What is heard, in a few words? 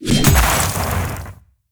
black shield overload